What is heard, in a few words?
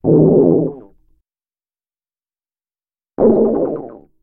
fart sound